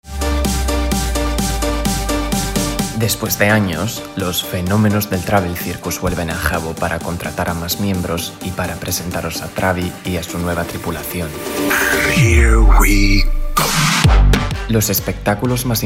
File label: Jingle; Travel Circus; ES